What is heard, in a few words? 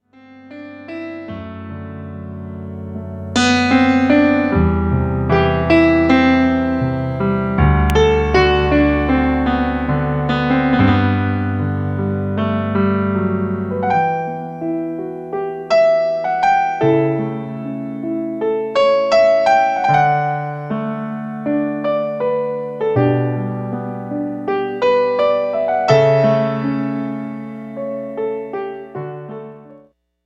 Arioso Bach piano